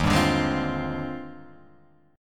Eb Major 13th, EbM13 chord; strummed; JGuitar

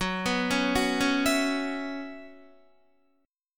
Gb 7th Suspended 4th Gb down Guitar, chord

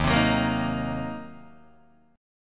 Eb Minor 6th Eb down Guitar DADGAD; strummed

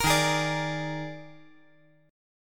Eb Major 7th Suspended 4th Eb; strummed; 15,15,16,17; EbM7sus4

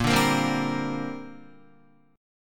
Bb 6th Add 9th Bb Guitar; Bb6add9 strummed; 6,5,8,5,7,5

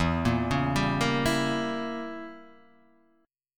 E Diminished 7th E down Guitar Half Step Down

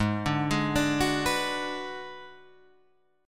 G sharp Minor 7th Flat 5th G sharp down; G#m7b5 arpeggiated; JGuitar

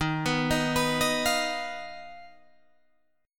Eb Suspended 2nd Sharp 5th, Guitar, Drop D

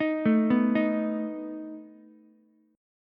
A Suspended 2nd Flat 5th A down Ukulele; Asus2b5 arpeggiated

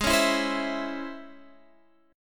C sharp Minor Major 7th, chord

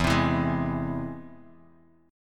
E Augmented; E+ strummed; JGuitar

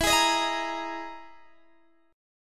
E Major 7th Flat 5th E down Guitar DADGAD

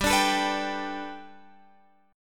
G Major Add 9th Guitar Open F, chord; Gadd9; strummed

